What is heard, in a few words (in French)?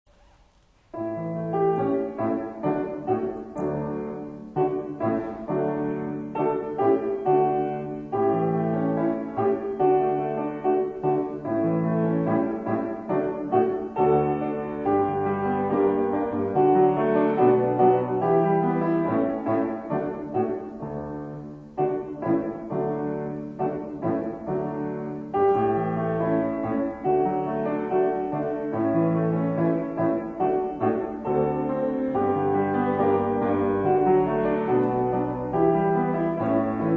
moineau instr